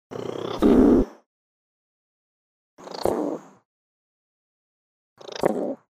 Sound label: Varecia growl huffs, Lemur; V. v. rubra; The Audible Phylogeny of Lemurs